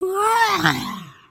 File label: Stun, creatures organic